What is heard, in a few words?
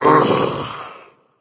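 nonverbal ugh; smite; voicepack; merlin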